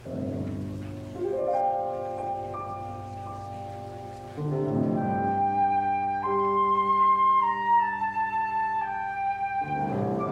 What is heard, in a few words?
Chamber Music